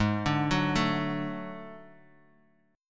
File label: D Major Ab; D/Ab; arpeggiated; JGuitar